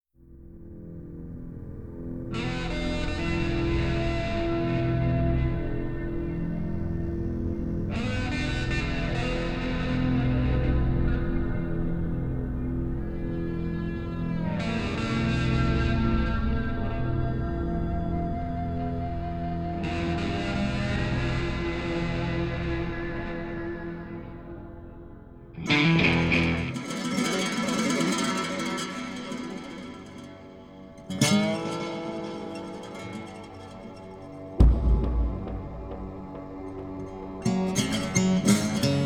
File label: Dirty Organ Brothers Guitar Theme; Deans